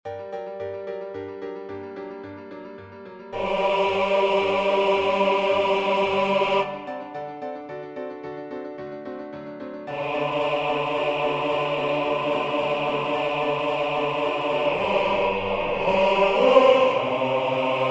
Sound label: Fecit Potentiam bass